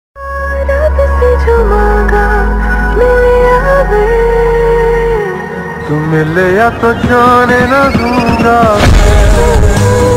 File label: ringtone love ringtone instrumental ringtone